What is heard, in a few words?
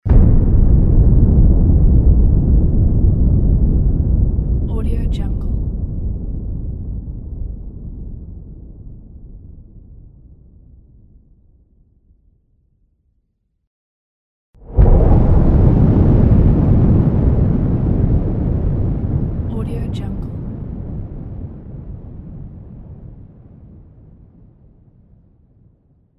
big explosion